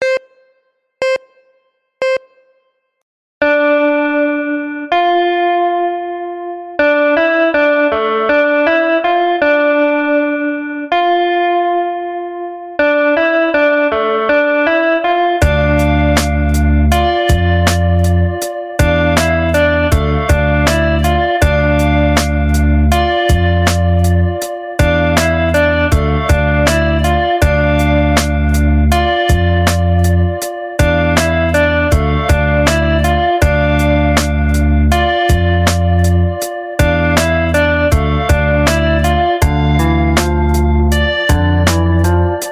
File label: Sweep; Xigbeats; CoCoon